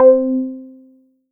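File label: PLK C4, Roland SH-09 synth pluck; Leads and Keys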